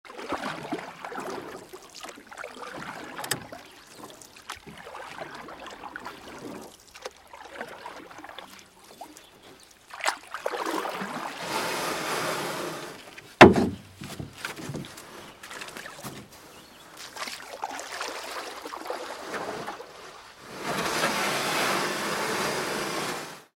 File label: Canoe Paddle; Ambience; iLife Sound Effects